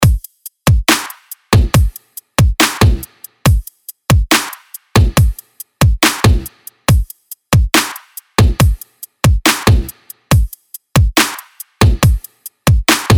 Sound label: 140 Drums